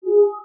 Science Fiction Sci-Fi Electronic Door Permitted to Enter